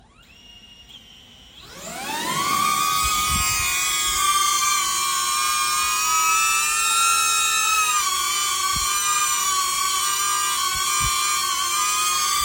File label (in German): DJI Neo Lautstaerkentwicklung beim Start